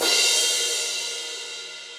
Crash 14 Session Dry, Cymbal; Drums; Drum Hits; Standard Hits; Session Drums Studio

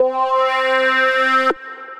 Wah Wah Solo, Synth Lead; Ableton; Glitch and Wash

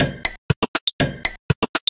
Wood n Click 2, Vector Wave Sequences; Ableton; Retro Synths; Components

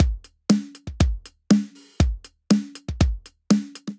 Kit, Drum Kits, Basic; Roto; Ableton; Drum Booth

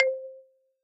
Wooden Xylophone; Instruments; Fleamarket Percussion; Ableton; Factory Packs; Lost and Found

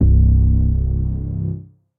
Sub Floor Bass; Ableton; Factory Packs; Punch and Tilt

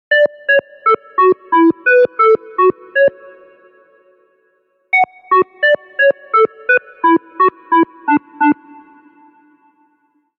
Sine B Minor 87 bpm; MIDI Clips; Tonal; Melodic; Ableton; Factory Packs; Chop and Swing

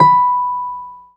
BD B4; Keyboards; Ableton Foundation FreePacks; Model-C

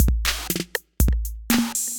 Kit 808; Drum Machines; Effected Drum Kits; Ableton; Factory Packs

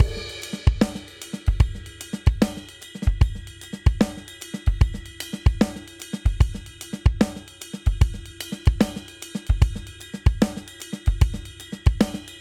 Triplet Hip Hop Chorus 75 bpm; 4 4; MIDI Clips; Ableton; Factory Packs; Drum Booth

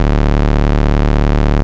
Bass D0; Ableton Foundation FreePacks; Foundation Glitch Toys & LoFi

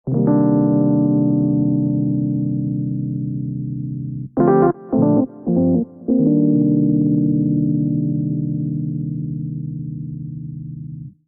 Muddy Whirl Piano C Minor 85 bpm; MIDI Clips; Tonal; Accompaniment; Ableton; Factory Packs; Chop and Swing

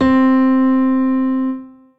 Grand Piano Stretch Bright Solo; Ableton; Factory Packs

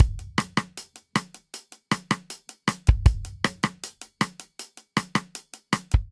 Twist Intro 157 bpm, Drum Booth; 4 4; MIDI Clips; Ableton; Factory Packs

